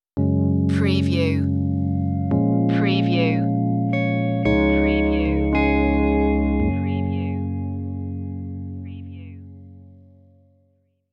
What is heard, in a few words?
stirring spirits stinger